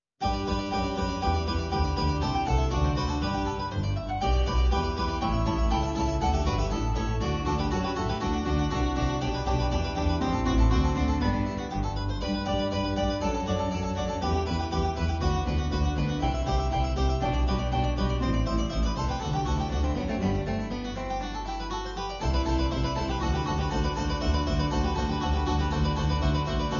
Mandolin Ensemble; Arrival of the Queen of Sheba

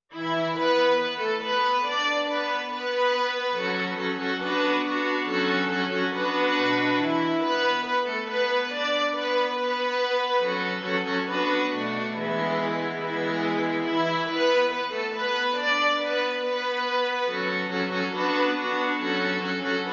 Young String Quartet; Two Dances

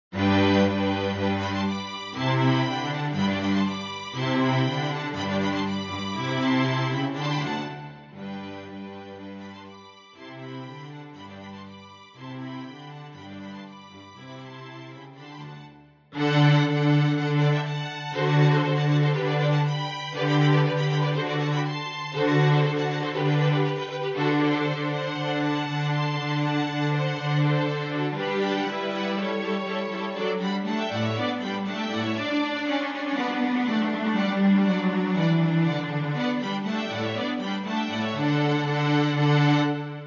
Sinfonia in G Major; Stamitz

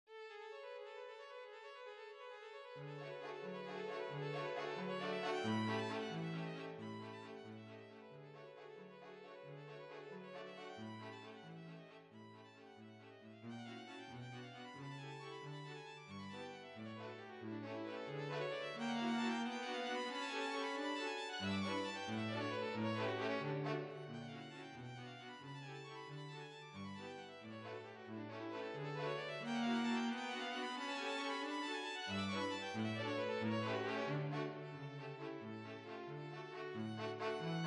Waltz; Minute

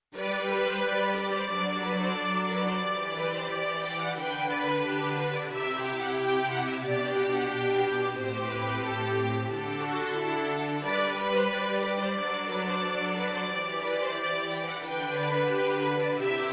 Strings

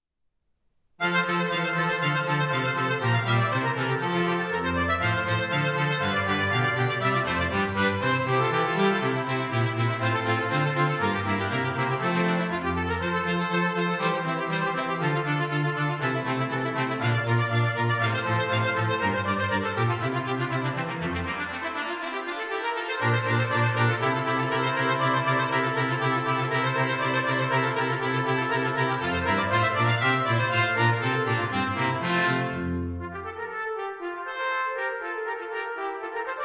Brass Quartet; Arrival of the Queen of Sheba

